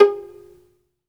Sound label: SOLO VI, Synclavier Strings; ILIO